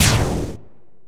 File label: laser slam fast; ExperimentalGear